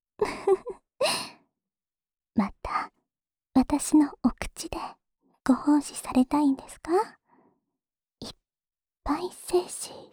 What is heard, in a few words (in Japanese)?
オナサポ用フェラチオ; ASMR